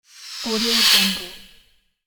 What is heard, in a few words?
swipe swoosh